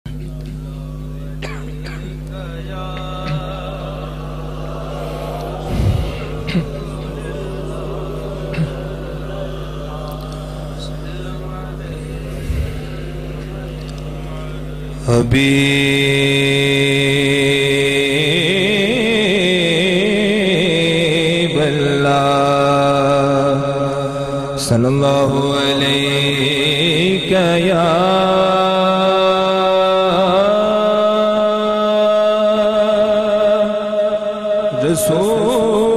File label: Panjabi Naat; Wah Cannt 2016